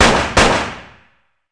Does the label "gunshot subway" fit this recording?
Yes